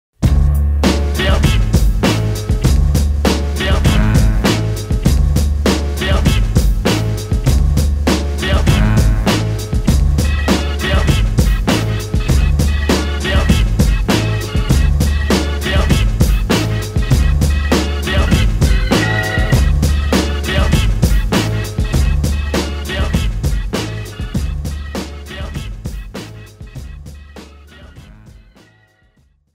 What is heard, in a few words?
Mix Instrumental